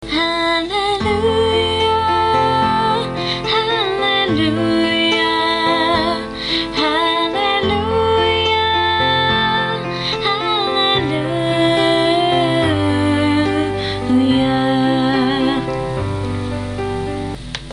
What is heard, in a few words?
The Only Bit Of Hallelujah Chimes Can Sing